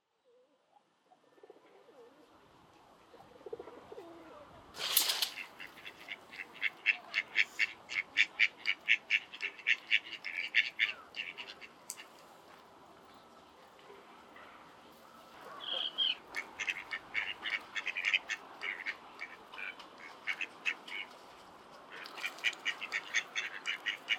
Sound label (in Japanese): 東京都不忍池での鴨です。; 鴨2025年4月30日東京都不忍池; 野鳥の声